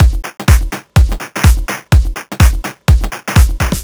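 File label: bass drum Loop, Drum Loops 125bpm, Nu Dance Electro; Chicago Vibe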